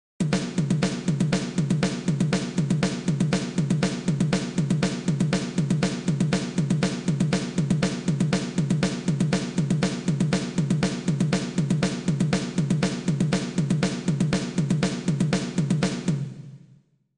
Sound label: Repique